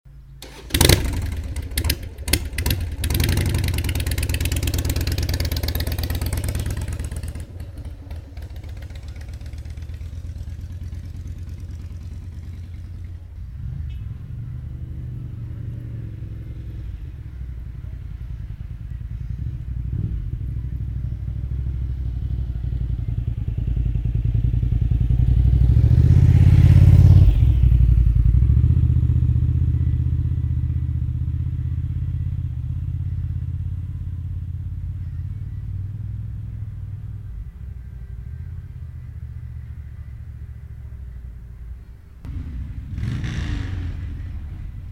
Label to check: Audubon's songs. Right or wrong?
Wrong